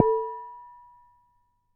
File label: Damped Hit, Glass, Large Wine Glass